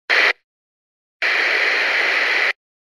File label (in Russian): zvuk racyi